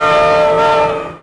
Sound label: comet chime; nswgr silver city comet dp103